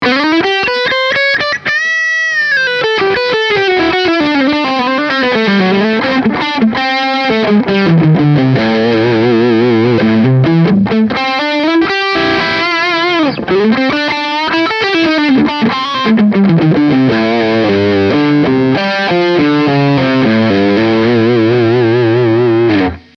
fender squier bullet strat hss rw bridge distorted hard rock metal lick